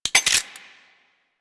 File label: Gunblade Mech